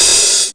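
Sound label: DRUMULATOR open hat; classic machines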